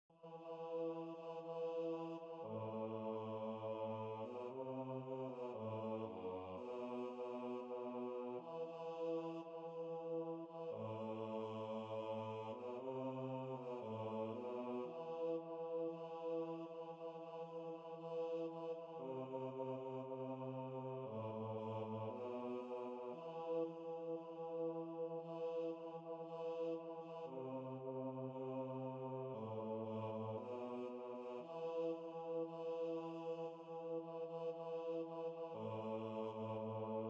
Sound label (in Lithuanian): BASS GARBE Šv Augustino mišios; angelu sargu giesmes